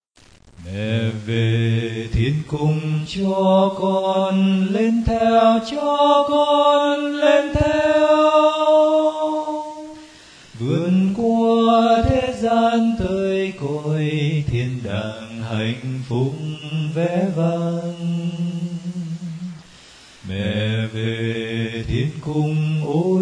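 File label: soprano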